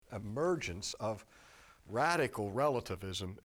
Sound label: breath; smackdown